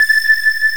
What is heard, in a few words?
SYN, SYNTHESIZER; DIGI WAVE #1